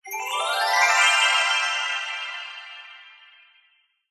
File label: fantasy magic glissando 90s style dreamy ascend